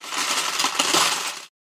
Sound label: Lego box full of Legos moving and shaking